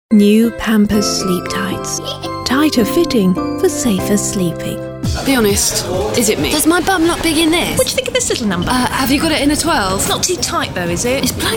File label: House Reel